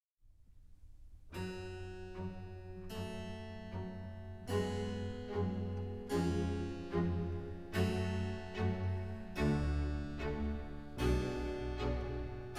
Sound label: Violin Concerto No.2 in G minor, Adagio e spiccato